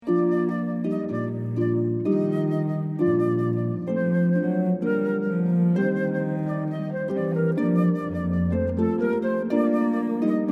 Haydn Variations, Brahms, harp flute cello

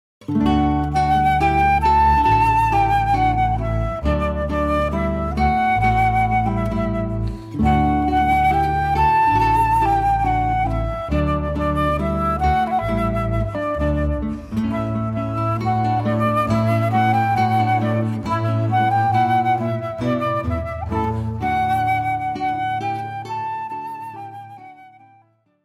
guitar flute bass trio

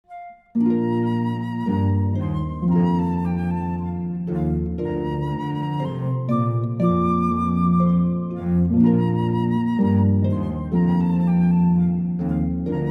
Pavanne, harp flute cello; Wachs

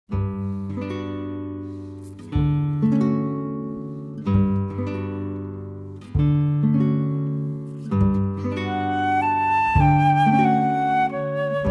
guitar flute bass trio; Gymnopedie

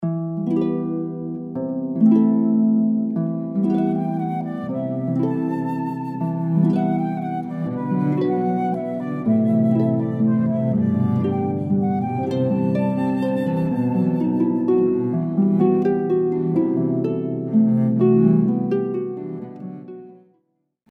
harp flute cello

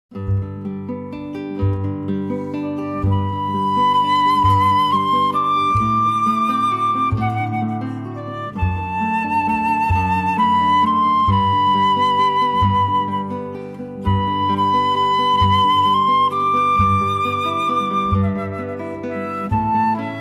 On Wings of Song, guitar flute bass trio

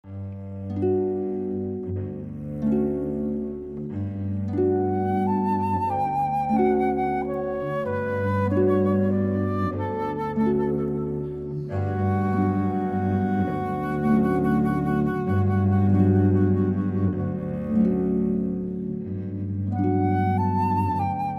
Gymnopedie, Satie, harp flute cello

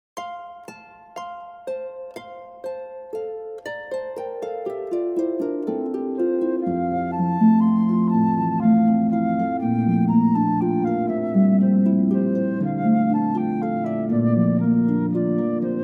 Ash Grove, harp flute cello

